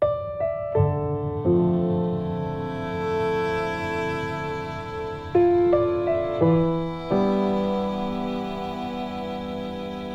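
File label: A Short Fight (piano violin); piano vln; string overdubs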